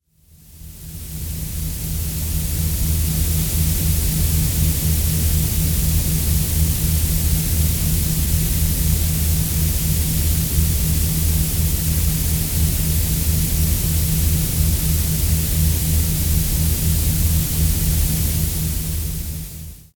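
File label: grey noise binaural beats 4hz 2hz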